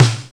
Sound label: Linn LM-1, digital; 90 s Sample CDs; Sound & Vision - Gigapack I CD 1 (Roland)